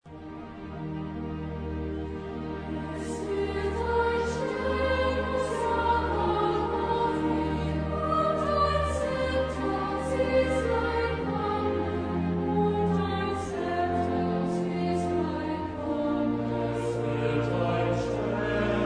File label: Oratorio, chorus; Mendelssohn; Christus Op97; Es wird ein Stern aus Jacob aufgehn